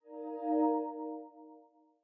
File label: success sound